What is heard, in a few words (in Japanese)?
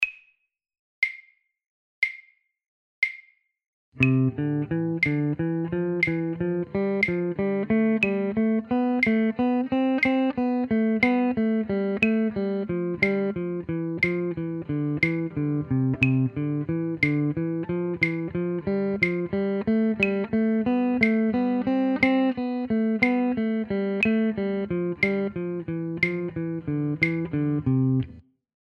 doremi rensyu